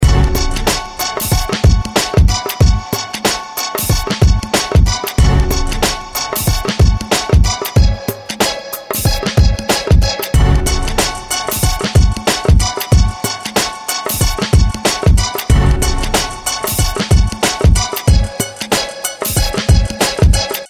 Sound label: Loop Mixture Drive Compressor